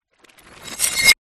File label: reversed broken glass; sound design transition; zapsplat